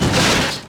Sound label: crush, Impact